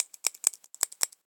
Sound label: click a mechanical pencil